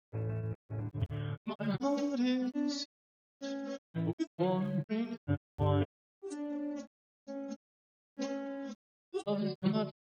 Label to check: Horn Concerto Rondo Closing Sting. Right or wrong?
Wrong